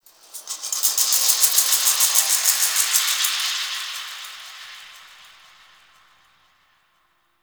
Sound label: Exterior Space Rings Details; Warframe; RailJack; Ambience